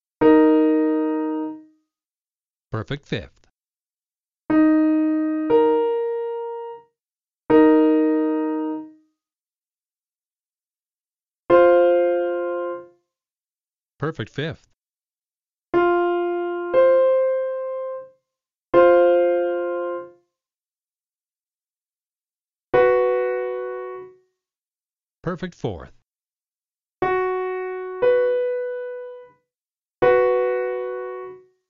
Perfect Fourth Fifth harmonic

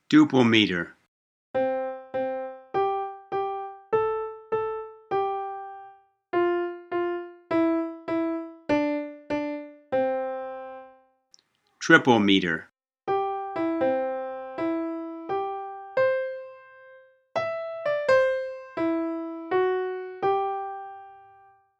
Duple Meter Triple Meter

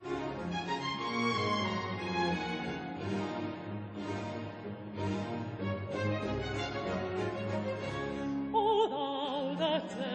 Accompaniment

